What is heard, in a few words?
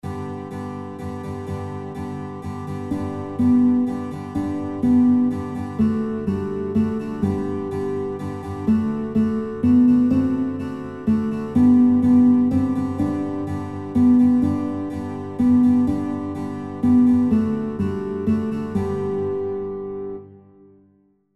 Guitar, kinderlieder gitarre playalongs; Guitar Songbook; Kuckuck Kuckuck Rufts Aus Dem Wald